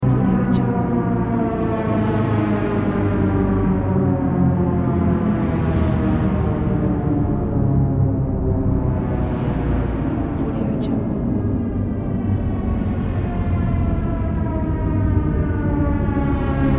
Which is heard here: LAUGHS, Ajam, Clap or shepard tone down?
shepard tone down